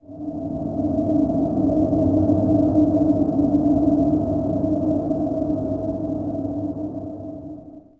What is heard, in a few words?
wind; DAoC